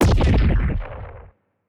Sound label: titan hit explosion tonal, weapons; kaiju; Stellaris; biogenesis